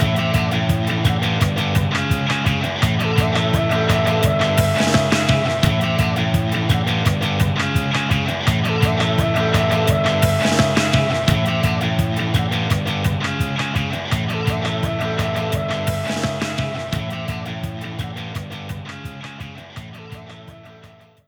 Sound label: Jam Session; Splattack